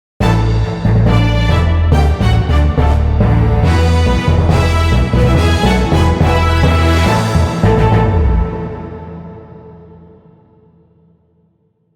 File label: Victory!; Gigabash